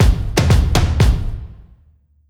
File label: Stomp Transition